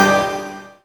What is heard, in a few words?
GLASSTA; NorthStar - The Wizard VOL-2; THE VOID